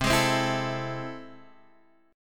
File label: A Minor Major 7th, C